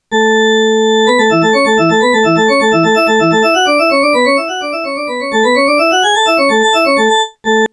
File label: organ